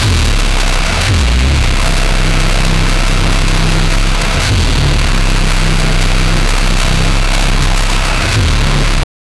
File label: aggressive cat 3406b, truck